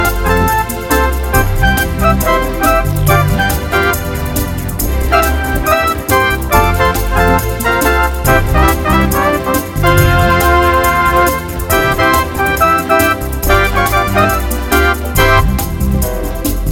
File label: A Happy Jazz Club